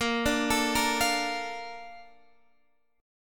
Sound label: Bb Major 7th, Bb, down, Guitar, Standard; BbM7 arpeggiated; JGuitar